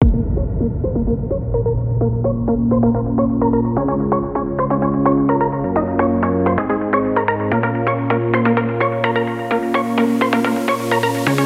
Big EDM

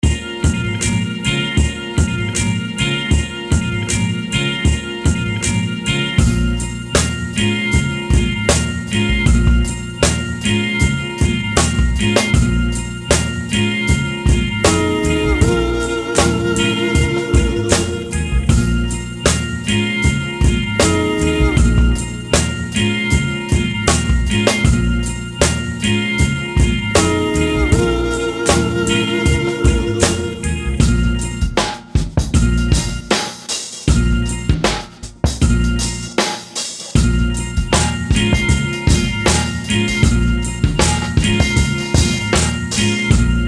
Blues

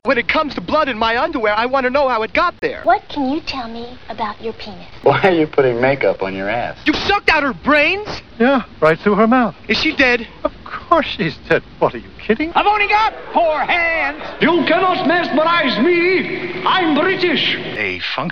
cuts beats breaks samples stuff; threepeeoh

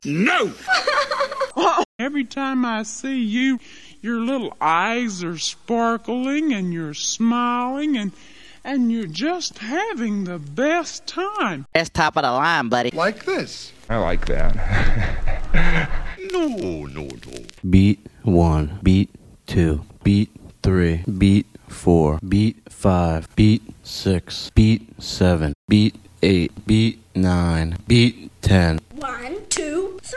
cuts beats breaks samples stuff; threepeeoh

